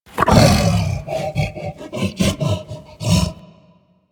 Juggernaut Truffle Fidget, Infested; Warframe; Truffle Hunt

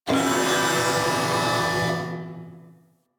Gas Robot Repair Spin; Ambience; Props; Warframe; Corpus Gas City

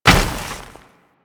Moa Combined Step Snow, Footsteps; Enemies; Warframe

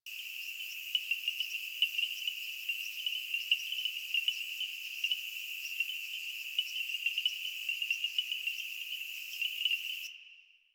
Night Insects High Loop E; Ambience; Props; Loops; Warframe; Eidolon Plains

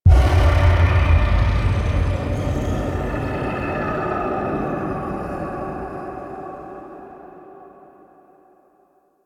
Transmission Start; Entrati Labs; Evil Twin

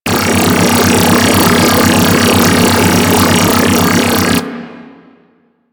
Space Trench Run Energy Gate Loop A; Warframe; Ambience; Space Battles

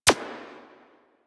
Decoy Counter Measure Shot

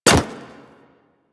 Hyena Micro Step Default, Footsteps; Warframe

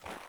Sandals Run Snow, Footsteps; Characters; Player

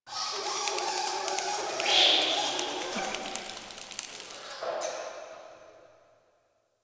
Bats; Dagath; Warframe; Ambience; Clan Dojo; Dojo Misc

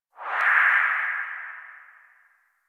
UI Starchart Galaxy Zoom Out; DE; Warframe; Starchart Redux

